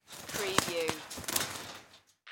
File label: Bird Cage Shake Wings; sound-effects-bible